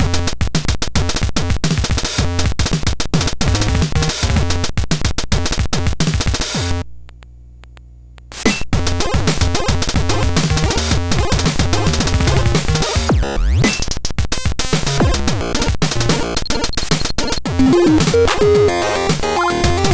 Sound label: sndtest gba; gbsoundcomparison